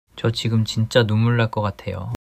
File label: crying